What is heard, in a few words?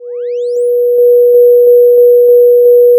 Sound of a police car siren